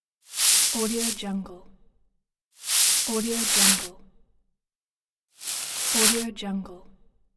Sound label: hay movement; moonaudioproduction